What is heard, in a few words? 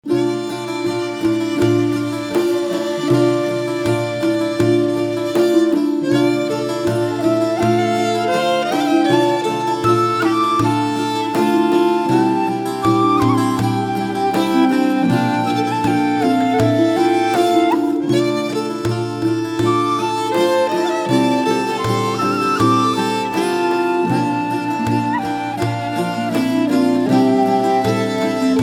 Tavern; Two Worlds 2